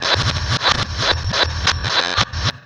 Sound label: Fill Break, Synchro Funk, Beats; Sonic Foundry (Sony Creative Software)